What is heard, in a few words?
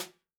jungle snare chromatic, real drums